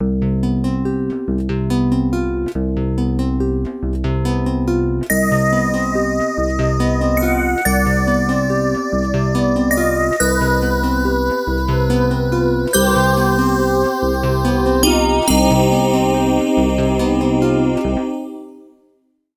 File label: Version E Strums